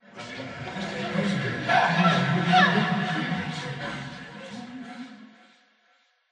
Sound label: Voices Ambient